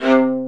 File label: Spicato Basses & Celli